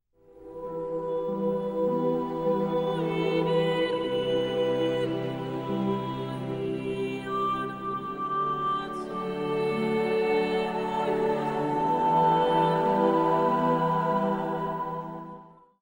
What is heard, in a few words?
cadences